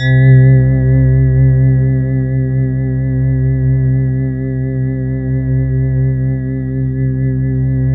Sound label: C4, Synth Line; 90 s Sample CDs; NiceBeats; SynthLine VOL-24